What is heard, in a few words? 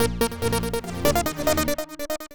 synth